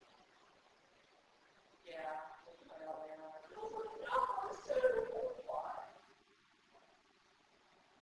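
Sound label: Elevator filtered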